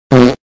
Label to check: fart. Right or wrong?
Right